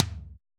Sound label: tom, Roland MC-909